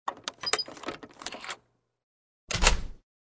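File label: Key card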